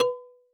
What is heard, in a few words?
marimba kalimba; ゆめ2っき